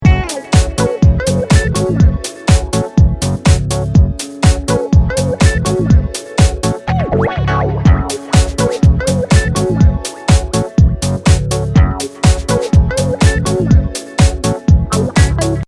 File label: poker music